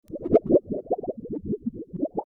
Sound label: Under Water Sound; FXHome